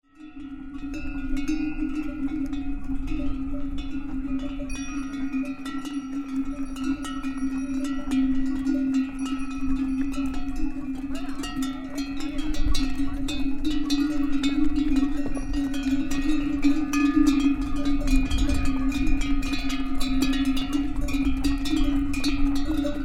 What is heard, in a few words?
donkey walk